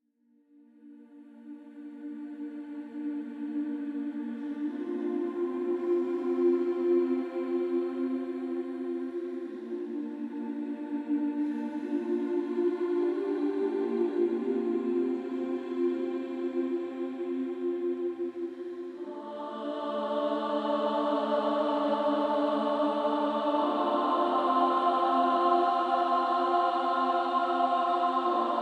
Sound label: Choir; Chained Echoes